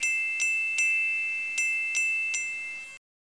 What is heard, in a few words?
Jingle Bells; HOLIDAY